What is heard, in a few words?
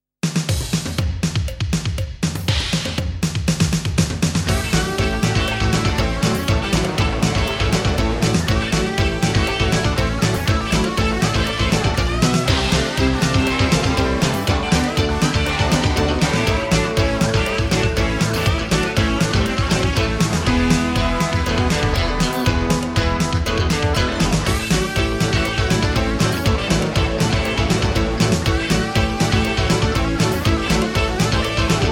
GUITAR MELTDOWN